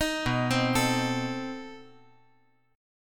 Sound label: B 7th Flat 9th B, chord